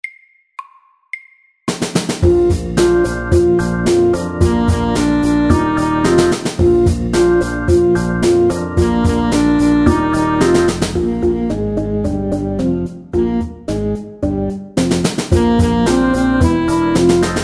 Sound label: Fizzy pop MEDIUM 110